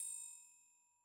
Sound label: zoom in crystal